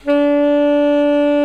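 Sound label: tenor sax E3; TSX TENR SAX; Giga Samples Collection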